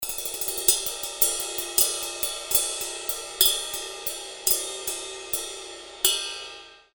Sabian AAX Ride, Ride Cymbal Samples - Sabian AAX Rock 21"; A multi-purpose rock ride with a big, bright bell and solid full-bodied ride tones; Drum Werks; These Sabian 21" AAX Rock Ride Cymbal samples